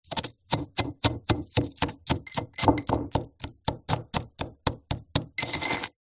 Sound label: sugar in pot hit break chunks teaspoon stir mix; zapsplat